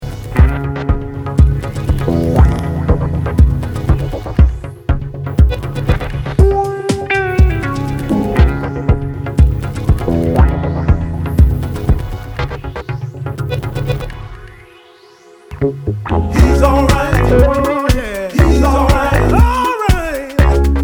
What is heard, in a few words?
Funk